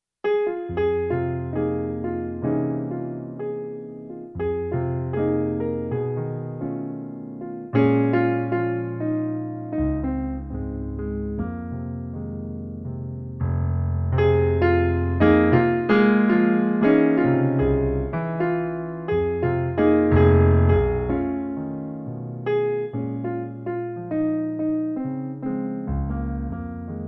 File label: f minor blues